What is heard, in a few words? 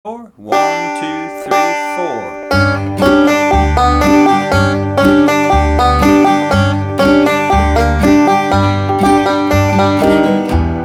60 bpm, banjo